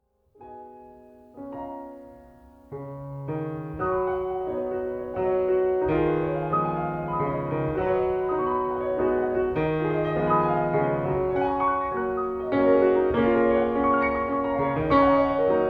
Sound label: PIANO